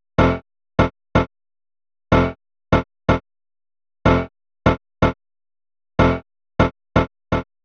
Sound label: synth loop, Dm, 124